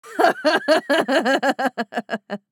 Crazy female evil laugh

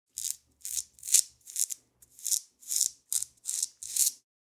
Vegetable peeling with peeler; cooking

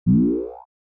Science fiction vintage data error

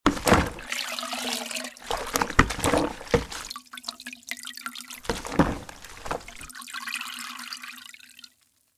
Mop into plastic bucket cleaning washing mop

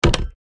Small wooden door close; household

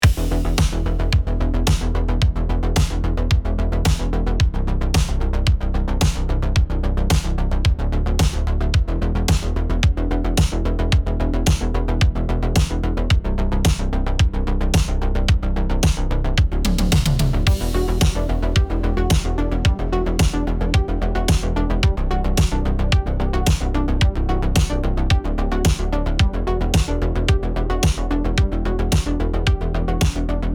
Retro retrowave neon dreams

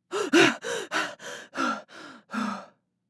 Woman excited rapid breathing

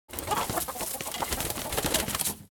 Chickens flapping wings

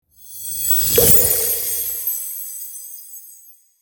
Magical cartoon pop

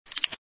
Robot blip

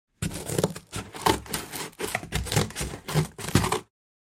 Scissors cut cardboard

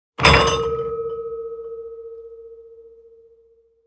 Glassware impact with shaking

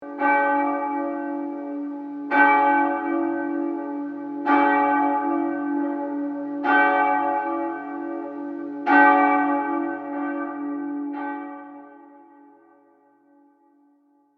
Church bells ringing close perspective

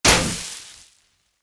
Party popper confetti celebration

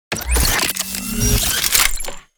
Sci fi plasma cannon reload futuristic

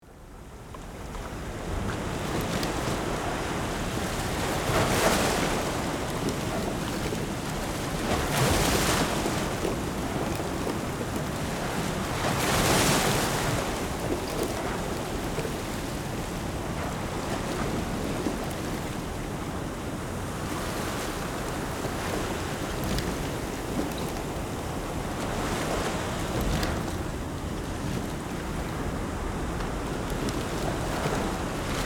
Ship at sea exterior ambience

